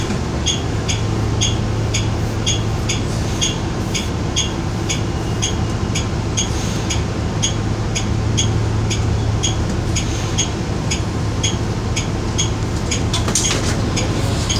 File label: Turn signal